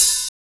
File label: Hihat Open